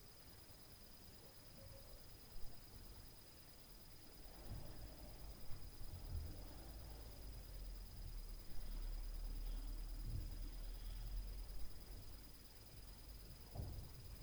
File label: STAIRS NOISE, Night Noise